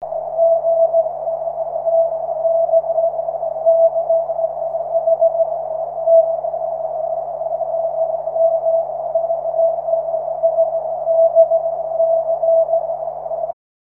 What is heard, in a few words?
cw, 23cm